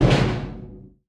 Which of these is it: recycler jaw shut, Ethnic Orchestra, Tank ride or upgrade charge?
recycler jaw shut